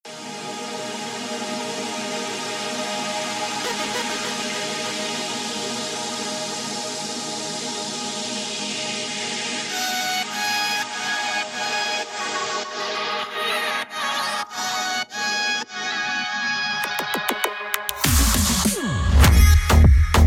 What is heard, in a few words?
aggressive drift phonk music